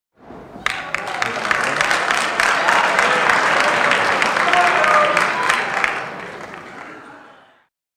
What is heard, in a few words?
Applause for throw; Human; BBC